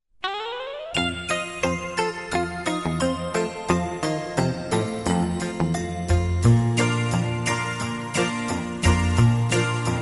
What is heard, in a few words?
instrumental